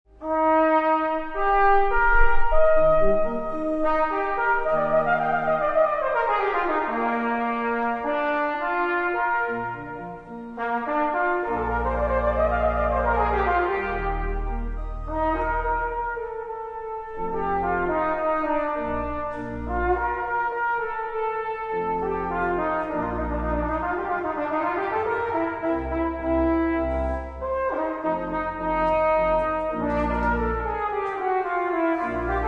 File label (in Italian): Corno